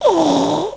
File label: dead, male; voices; Pirates Of The Caribbean